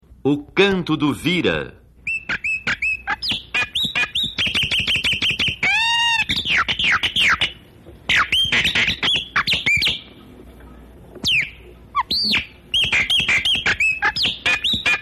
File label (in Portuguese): canto, cantos de aves; johan dalgas frisch